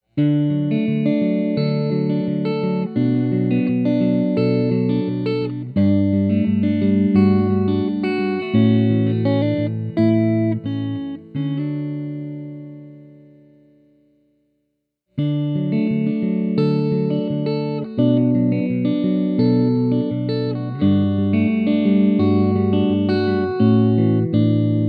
convertible humbucker clean, guitar

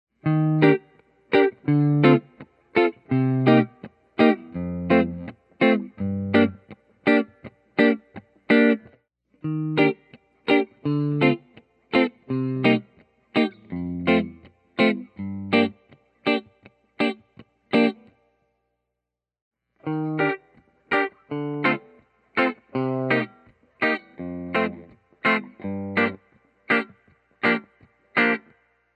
double cut p90 clean, guitar

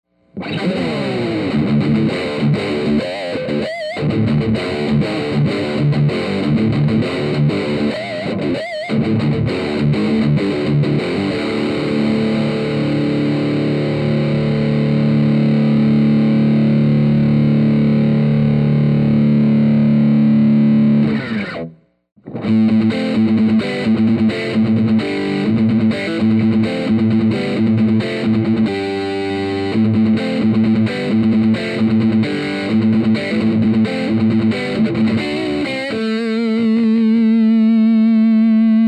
humbucker distortion; axxis

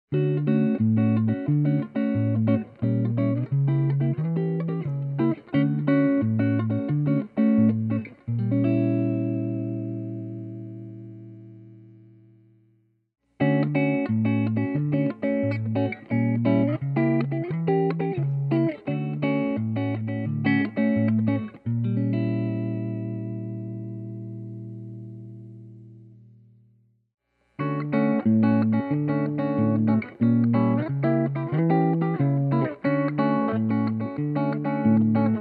roxxy clean

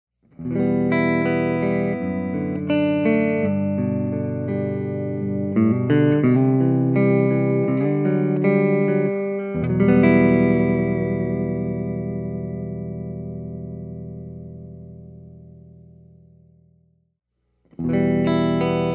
humbucker clean, guitars

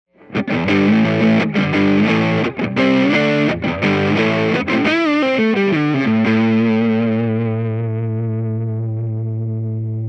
p90 distortion, guitar

